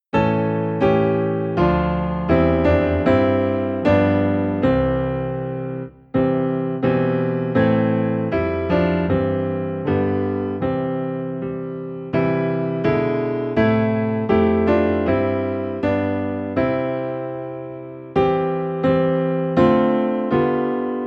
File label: Piano Low in C